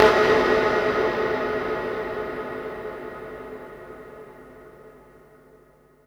PERC FX, Dance Drums; Best Service; Dance Mega Drums

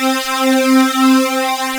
THICK SYN

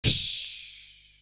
Beatbox; AMG

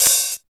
OP HAT; East West; Phat & Phunky

